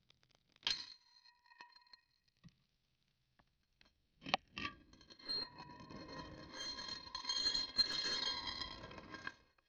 sound effect of an old, aging

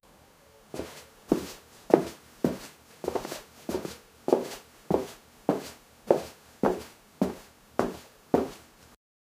the sound of light cloth, footssteps

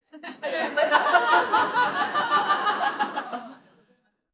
crowd of people laughing; social

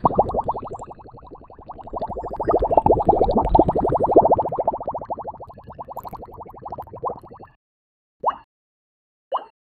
cute bubble explodes sound

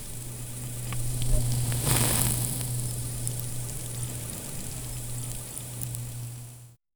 soft pop of the durian, fruits

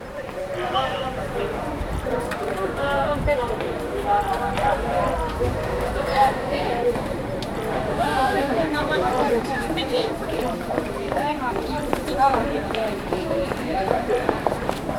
people walking in the wal, city

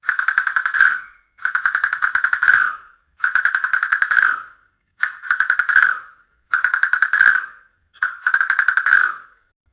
tyrannosaurus; dinosaur

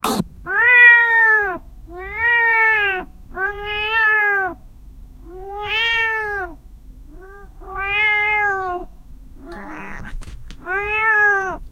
cat angry sounds; pet

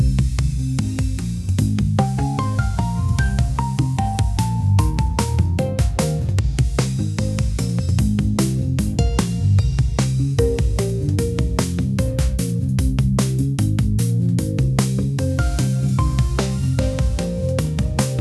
funky upbeat mood lifting

